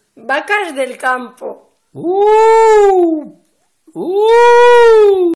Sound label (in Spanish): vacas del campo